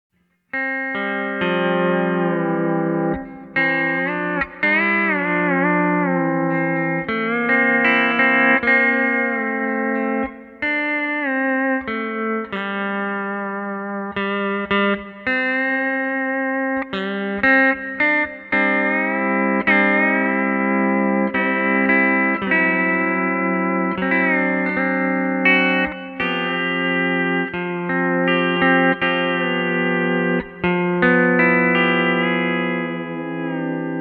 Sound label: Bass, AXE FX 3